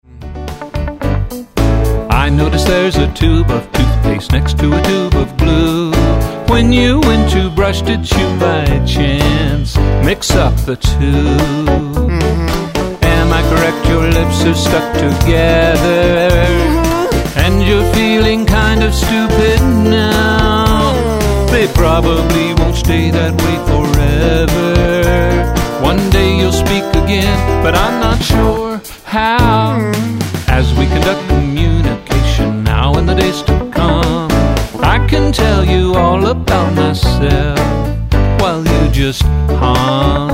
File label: Hum